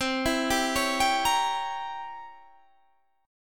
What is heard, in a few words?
C 7th Flat 9th C down Guitar Standard; C7b9 arpeggiated; JGuitar